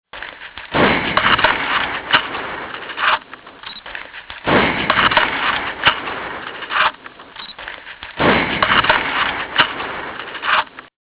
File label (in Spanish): Sonido disparos; Monasterio de Monsalud